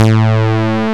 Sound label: DIGITAL BASS, BassGuitar